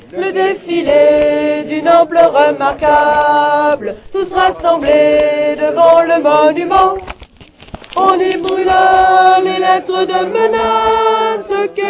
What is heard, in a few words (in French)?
mineurs sopranes